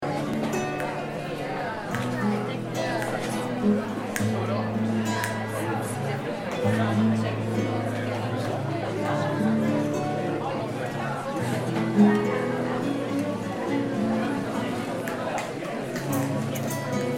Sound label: Pub ambience medium mixed crowd chatter